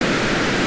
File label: surf